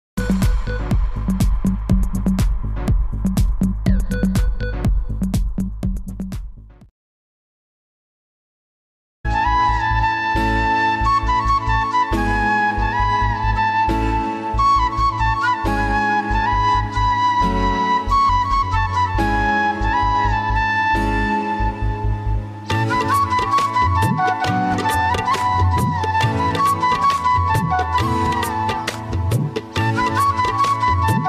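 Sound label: flute ringtone romantic instrumental ringtone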